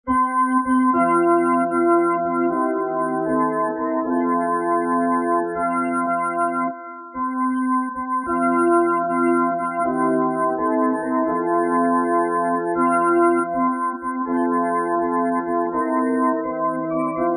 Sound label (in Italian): solo musica; strofa 1